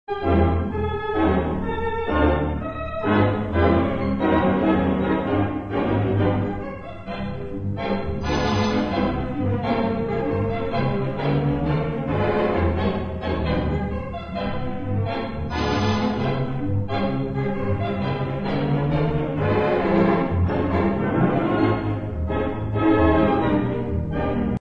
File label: theatre organ